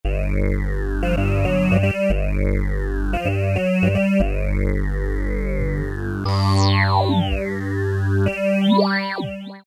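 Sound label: bass-MuthaFun; E-mu Orbit V2; Factory presets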